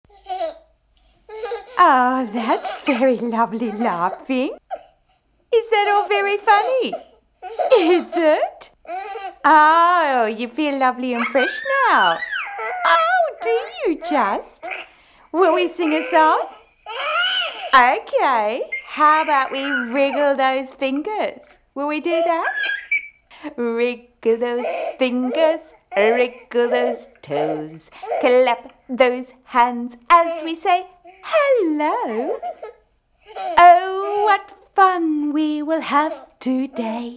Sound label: giggle nappy